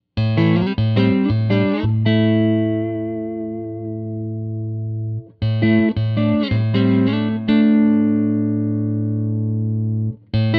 Neck, pickups

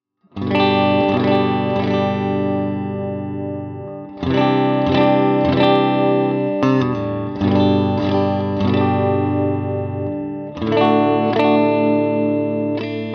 middle brighter, pickups

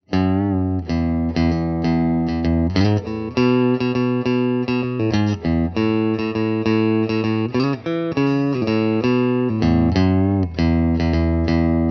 middle, custom pickups

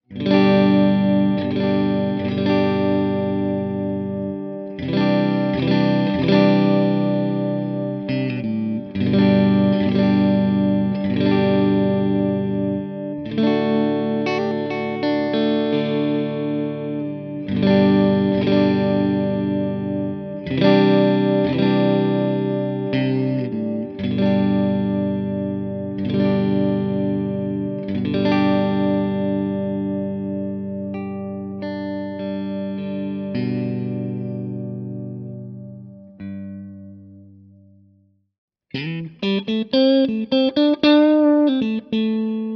Steel Pole Mojo Middle Neck Clean, pickups; Revel

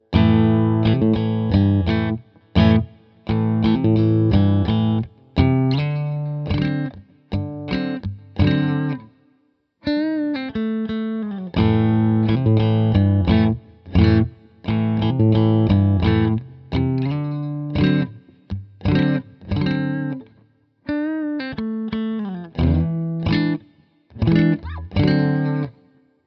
Bridge Full Clean, pickups

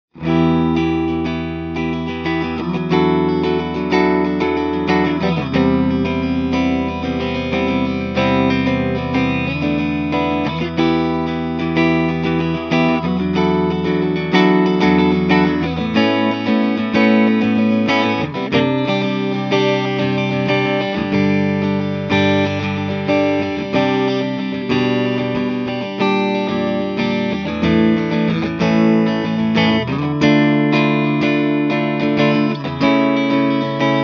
Bridge Neck, pickups

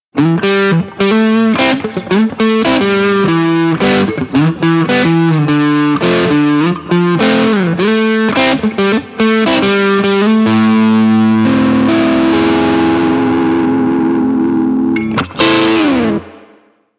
Bridge Neck Dirty

